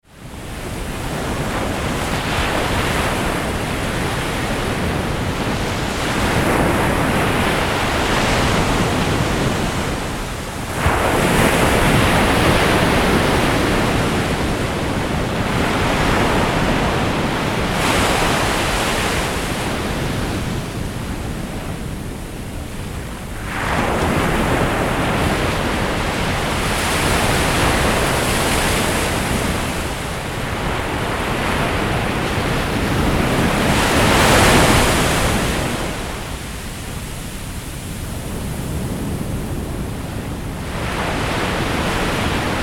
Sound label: Ocean Waves